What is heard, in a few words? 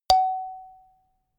notification kalimba single high